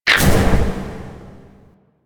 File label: Toggle Off; Kahl Order Mode; Warframe; Veilbreaker; Devourer Kahl